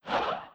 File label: Swish